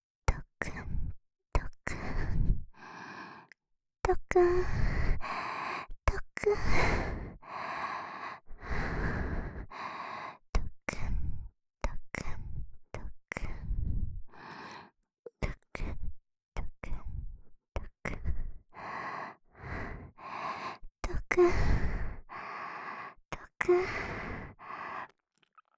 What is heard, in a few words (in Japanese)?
ドクン…ドクン…, ASMR, 左耳